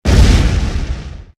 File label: plane; TankDefender